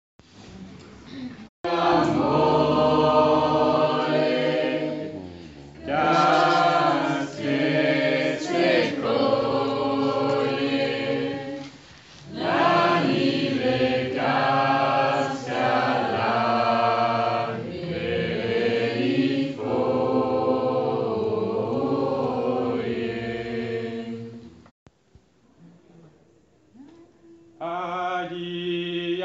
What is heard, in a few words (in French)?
voix basse; Cade luliva